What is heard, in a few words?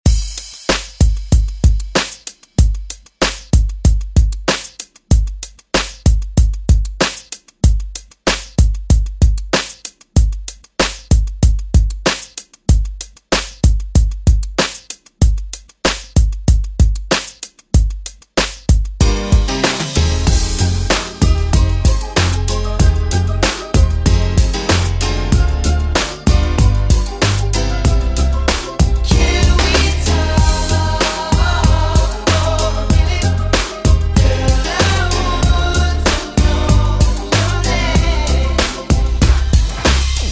90s ReDrum; DJ Mhark